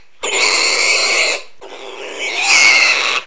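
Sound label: pig pain; REDNECK; RAMPAGER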